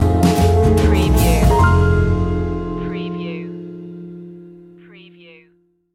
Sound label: jazz stinger